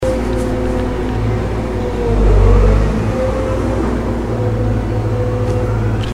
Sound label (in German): baggerschaufel